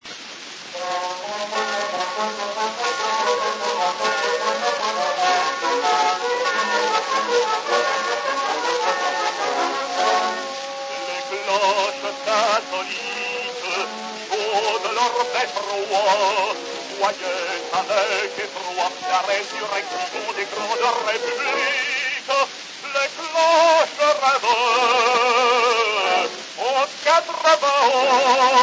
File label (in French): les cloches, orch; Weber